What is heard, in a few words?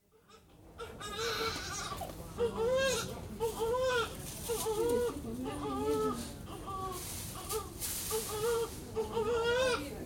Maternity ward atmosphere; Hospital; BBC